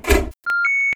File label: access error